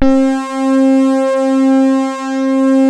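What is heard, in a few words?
MOOG MODULAR C4; Hollywood Edge - Giorgio Moroder Rare Synthesizer Collection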